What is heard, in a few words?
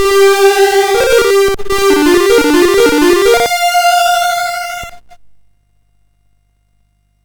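Operation Hormuz, MOS 6581 SID chip music, games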